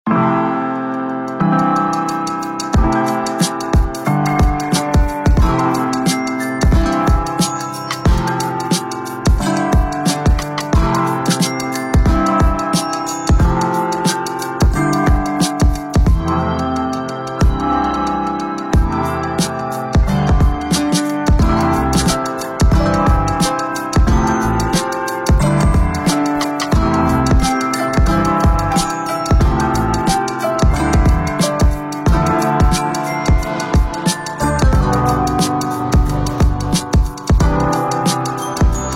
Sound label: Hybrid Keys; PlaySeries; Komplete